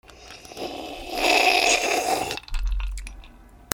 PEOPLE

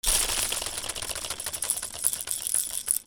TOYS

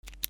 OFFICE

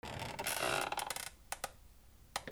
SQUEAKS

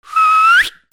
WHISTLES

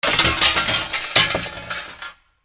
FALLING

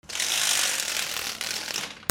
HOUSE

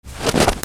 FOOTFALL

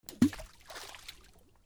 WATER

